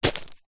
footstep single boot deep small granite stones dirt dust; zapsplat; foley